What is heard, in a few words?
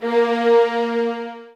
A, soft violins; Q Up Arts; Denny Jaeger Master Violin Library